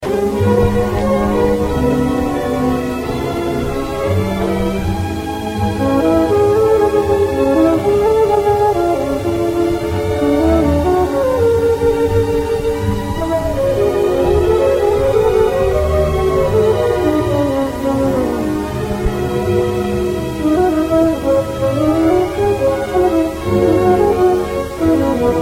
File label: low down flute